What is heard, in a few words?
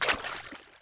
water step; Carnage Contest